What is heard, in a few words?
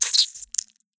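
blood squelch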